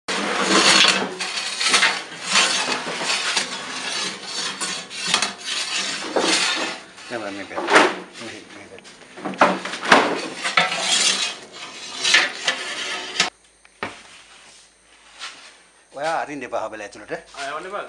Wood Fired Oven

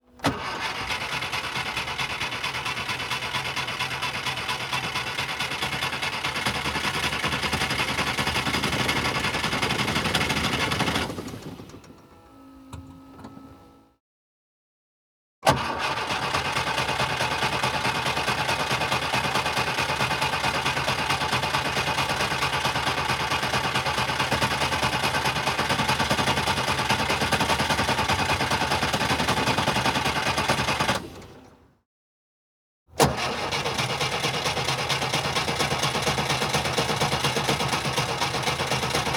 car does not turn on

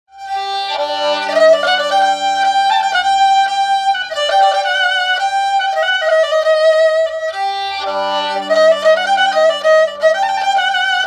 fiddle

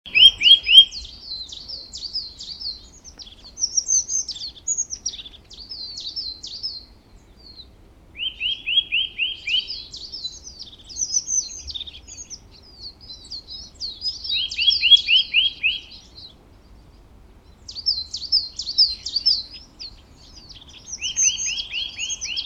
birds singing, nature sound

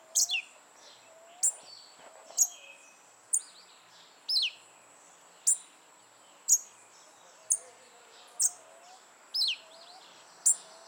Western red legged thrush

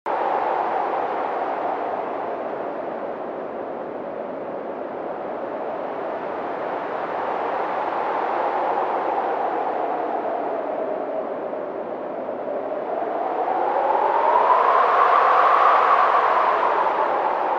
wind in desert; زوزه باد در بیابان

